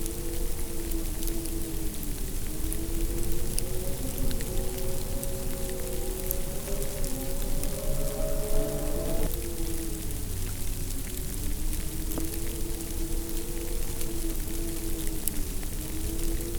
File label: blizzard ice storm; calendaria